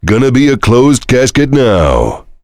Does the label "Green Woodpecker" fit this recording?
No